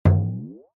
hit by hammer; player; micromayhem

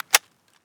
ump45 clip in, smgs; weapons; swb

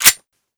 deagle slide forward, pistols; weapons; swb

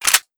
deagle slide back; pistols; weapons; swb

